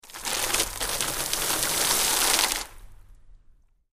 Large Sea Sprays Fall, bow wash

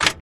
Door Metal Latch

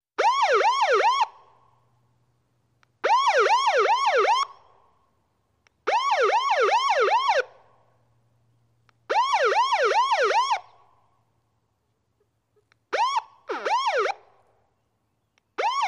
Yelper Siren Multipl, yelper

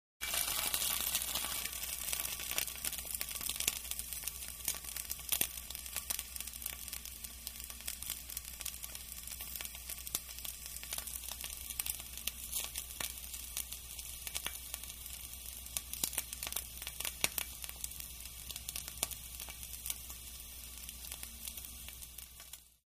Fry Meat CU Dry Sizzle

